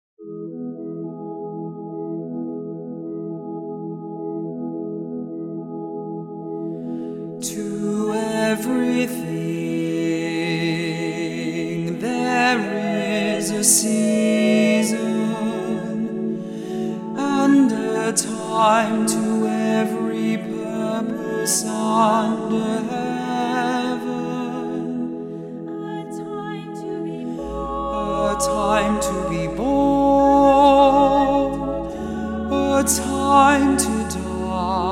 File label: Tenor